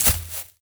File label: warrior walk grass